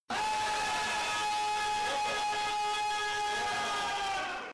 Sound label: death; worm; billy